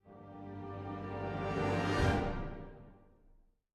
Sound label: music interactive; World of Tanks